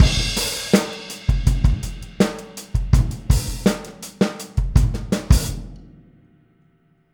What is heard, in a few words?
Boogaloo - Outro - Full Mix, Drums, 82 BPM